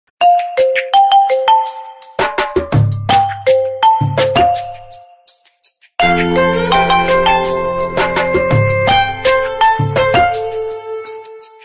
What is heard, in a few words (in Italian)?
cellulare huawei